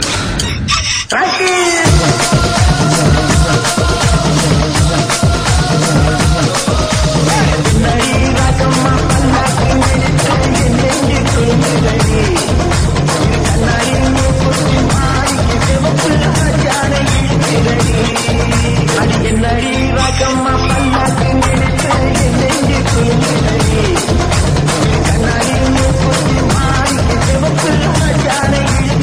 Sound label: Tamil Mix